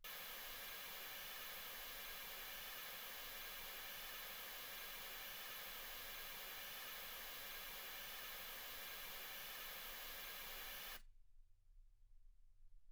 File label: mls16 48kHz